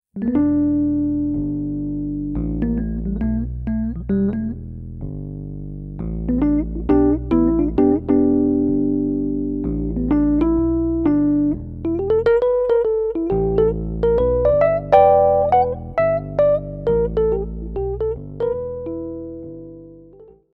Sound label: sparkle, fender rhodes sparkletop